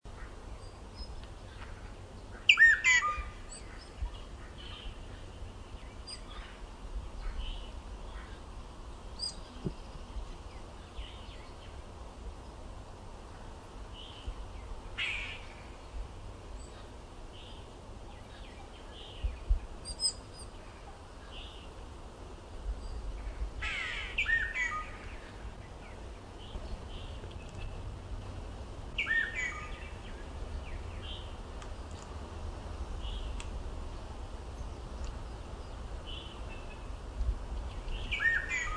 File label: Solitary cacique